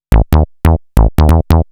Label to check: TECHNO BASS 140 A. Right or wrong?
Right